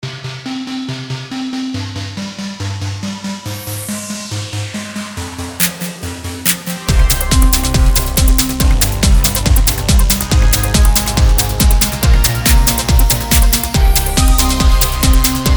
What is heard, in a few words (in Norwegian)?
synthpod signaturmelodi